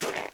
snow walk, step; Minecraft; Remastered

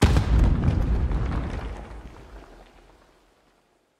explode

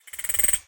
say, spider

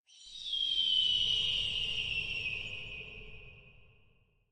egale; ambient; prey

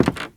squeaky wood walk, step, bamboo; blocks

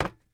metal box run; blocks; step

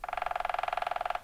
woodpecker

